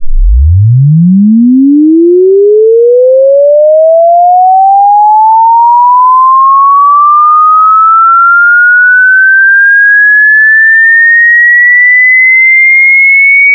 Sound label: Audible Sweep; MorgellansWaves